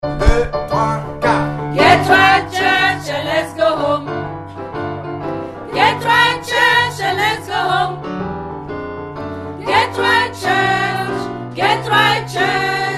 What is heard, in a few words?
SOPRANOS